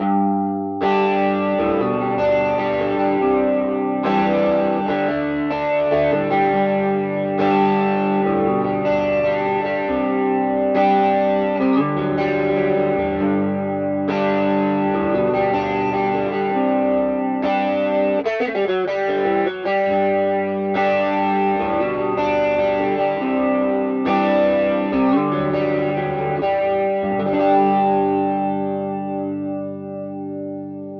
amp squeezer tetraplopia

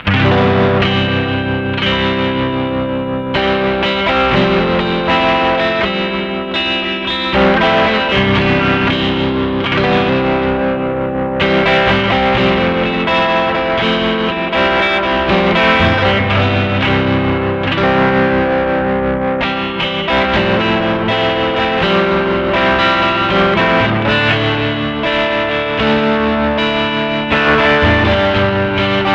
slow, overdrive; anagram amp squeezer; Frequency-shaped overdrive.

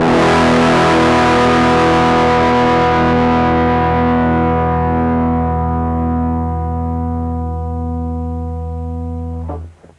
bassement plague fat, distortion

